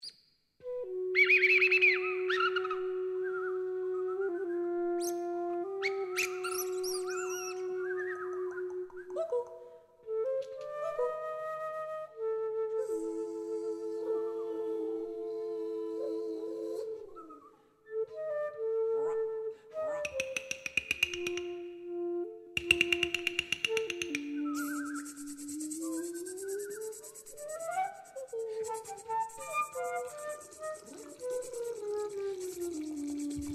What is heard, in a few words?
flute